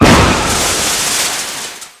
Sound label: Large Impact; Totaled; XBOX